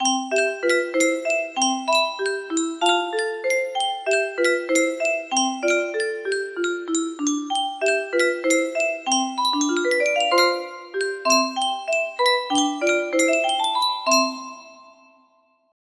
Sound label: melody